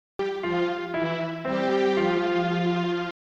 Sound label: logo